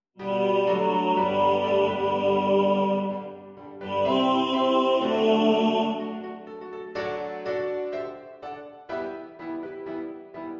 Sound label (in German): Tenor 2 im Vordergrund mit Klavier, Männerchor